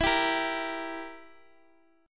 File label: E Diminished E down; Edim strummed; JGuitar